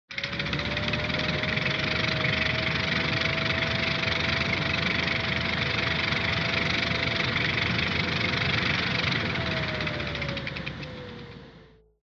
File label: Retro Anime Caterpillar Tread Low